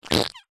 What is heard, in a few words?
human fart short with squeak; zapsplat